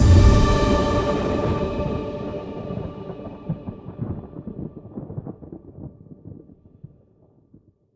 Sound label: Combo D; Mixolumia